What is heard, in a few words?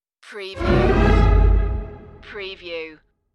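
Orchestra Horn Sting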